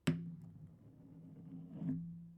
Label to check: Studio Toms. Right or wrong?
Wrong